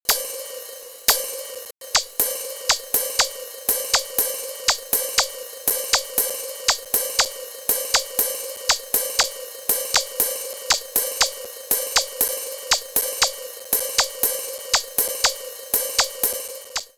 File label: Shift Register